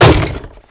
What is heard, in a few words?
chest wood impact, debris